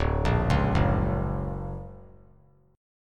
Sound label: D Augmented Major 7th down; D+M7 arpeggiated; JGuitar